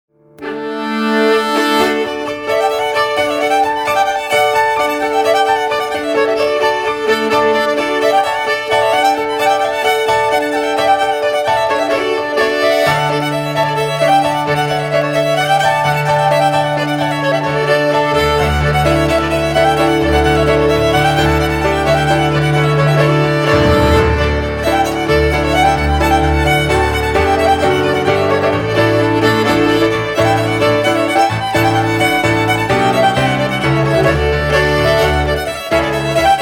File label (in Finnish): polska